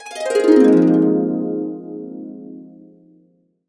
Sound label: Harps; USB Soundscan vol.62; AKAI